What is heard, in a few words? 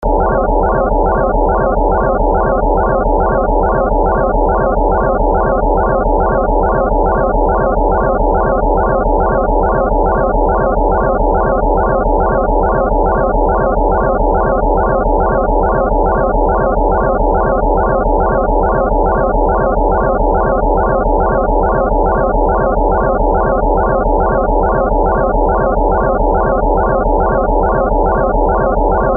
beep